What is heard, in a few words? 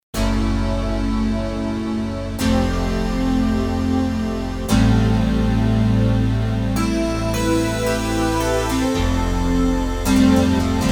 Stackoid, Roland JV-880; Factory Patches